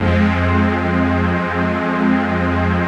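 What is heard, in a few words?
chord pad, Pads; ATMO PADS; Best Service; XX Large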